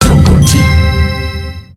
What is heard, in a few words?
bonus chance; Aztec Secret